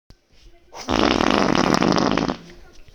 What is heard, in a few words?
fart